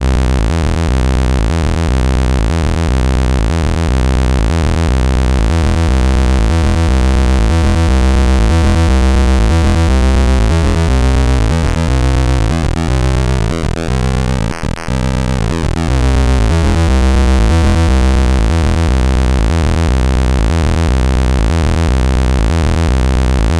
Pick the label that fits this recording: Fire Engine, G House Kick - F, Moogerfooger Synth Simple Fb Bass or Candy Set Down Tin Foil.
Moogerfooger Synth Simple Fb Bass